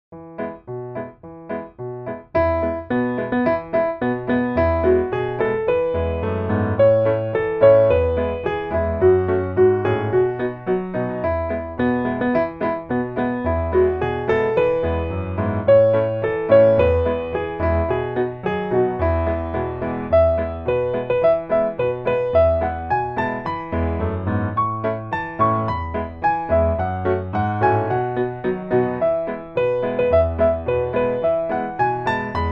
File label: piano